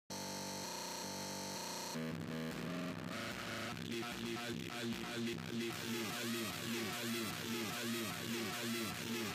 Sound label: Mad Phonk Energy